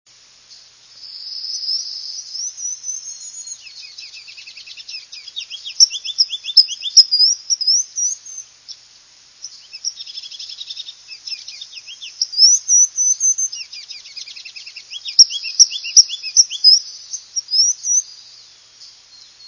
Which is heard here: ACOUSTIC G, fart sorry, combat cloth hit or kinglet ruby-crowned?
kinglet ruby-crowned